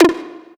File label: Synth Shot F